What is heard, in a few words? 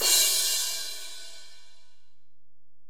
Ride, Alesis DM-PRO, Drum Machines